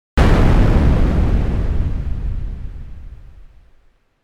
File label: thud